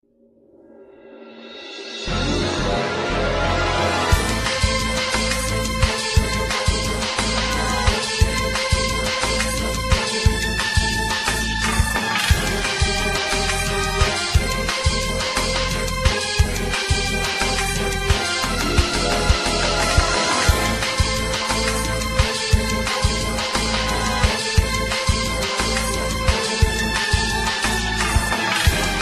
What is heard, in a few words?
russian melody; classical